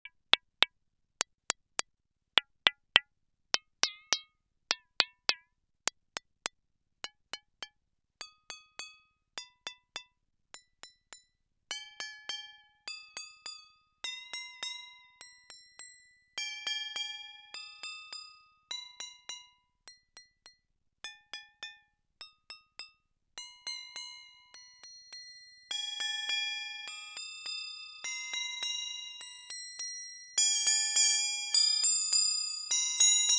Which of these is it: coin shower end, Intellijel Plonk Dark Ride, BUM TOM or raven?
Intellijel Plonk Dark Ride